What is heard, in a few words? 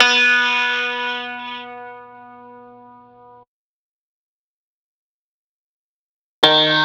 piano